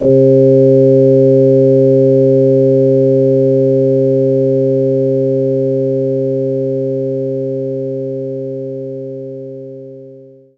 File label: Hammond Organ